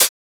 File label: Closed Hat; FLPRO